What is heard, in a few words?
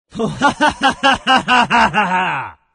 laugh, zh; Invo